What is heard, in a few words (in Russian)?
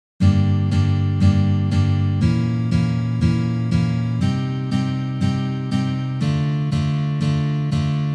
Uprazhneniya dlya igry akkorda Sol minor na gitare